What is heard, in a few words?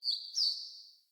Bird Double Chirp; Galene Bird